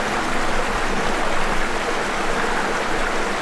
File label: water pipe; cstrike